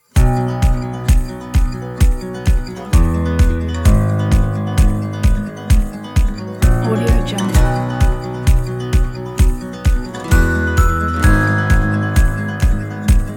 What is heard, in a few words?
inspirational indie folk; audiozen